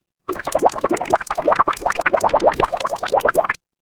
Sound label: water bubble